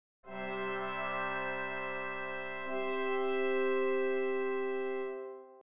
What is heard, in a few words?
4-part brass; Nikolai Rimsky Korsakov